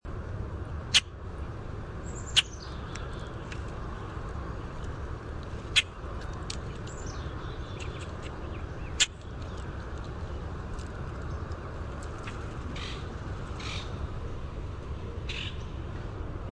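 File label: common grackle chaa chack, Quiscalus quiscula; Passeriformes Icteridae; FtC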